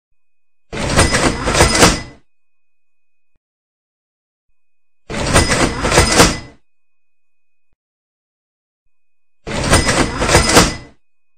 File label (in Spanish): maquina de supermercado